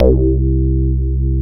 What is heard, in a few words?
SD1 ANAREZ, synthesizers; Soli-Music; World of Synthesizers VOL-1